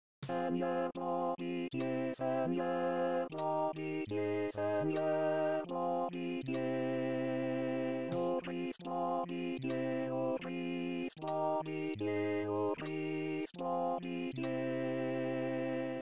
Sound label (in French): ermitage kyrie